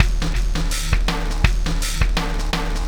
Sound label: System Overload Beat, Processed Drumkits